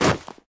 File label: snow land; Original TK Sounds